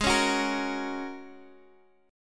G sharp 7th Suspended 2nd Suspended 4th, Guitar, Drop D; 18,16,16,15,x,x; down; chord shape